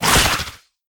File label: howl big beats